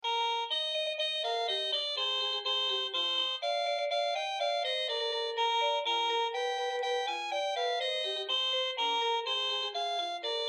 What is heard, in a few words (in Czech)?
melodie